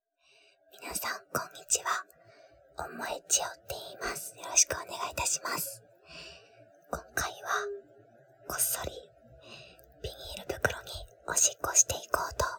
尿在塑料袋里 降噪; G-Sound

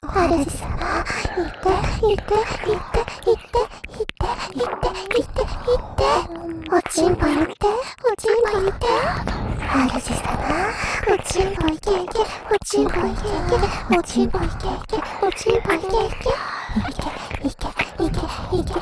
兩耳親吻舔拭; 主人♪ 肉棒射射♪ 肉棒快射射～～♪; 自慰輔助用特典音聲

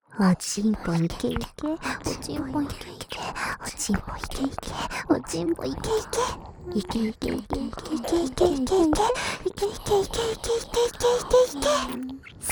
射精吧～～♪＋啾啵啾啵黏糊糊耳舔耳; 想被前戀人偶像嘲笑！; 由紀＆華戀; 附錄迴圈式音軌（自慰輔助）

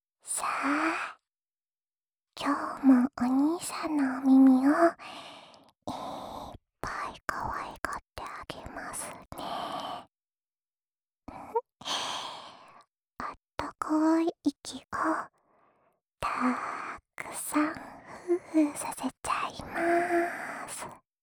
耳朵呼呼吹气 位置反转; 栉形与爱宕的耳朵呼呼吹气

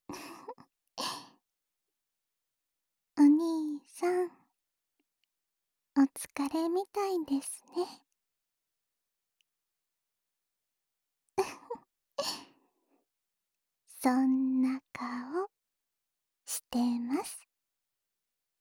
即兴舔耳（右耳）, ASMR

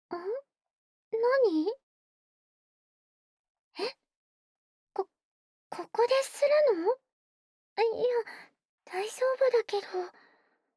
吹耳 右耳 開頭

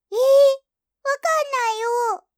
訊息（提示）

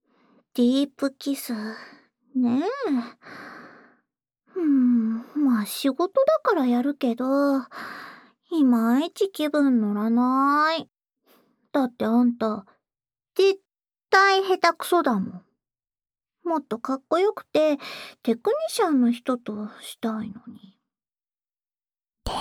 深吻; ASMR版

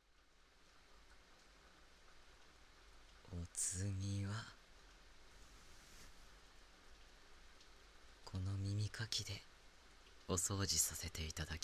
避雨 右耳的清洁

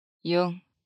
骰子

